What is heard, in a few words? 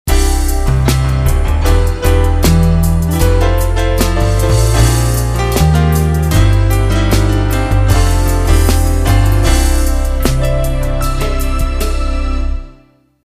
Cont Jaz Pno Trans